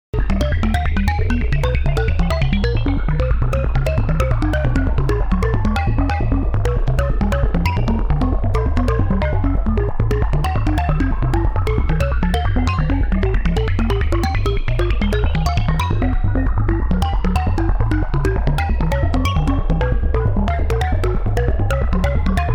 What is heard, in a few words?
moog, modular; modular planet